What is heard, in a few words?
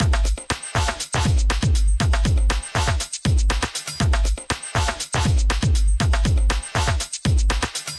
beat dry